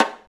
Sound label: Folk Snare Shot, Roland BK-5